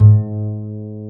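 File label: double bass G#1; Univers Sons; Jazzistic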